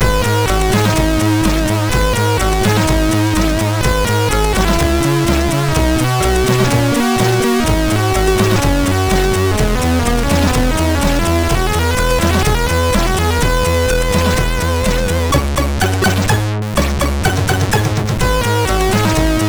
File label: sid MOS6581R3